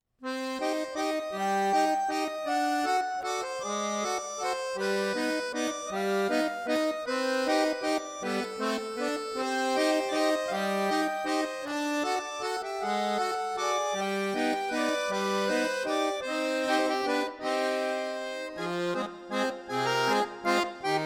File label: Accordion short; Gnomes vs. Fairies